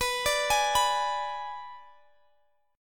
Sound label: Ab Diminished B